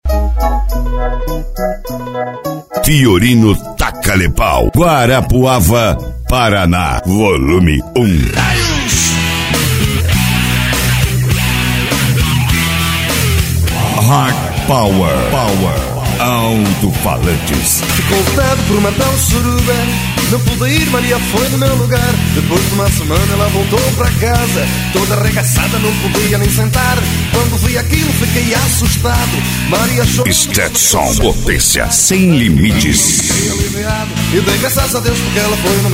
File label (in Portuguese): especial funk e electro house